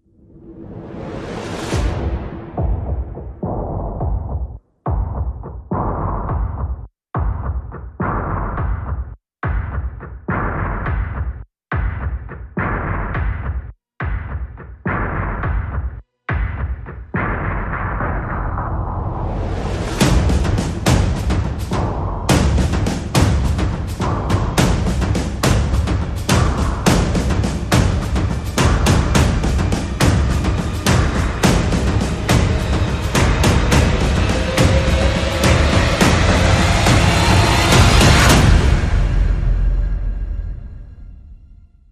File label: Percussion and FX